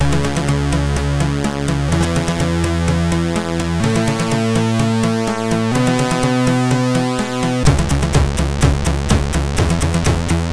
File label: sid MOS6581; Great Commandment; MOS6581R3